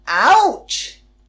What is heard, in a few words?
ouch; dollsHouse